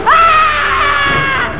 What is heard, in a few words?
scream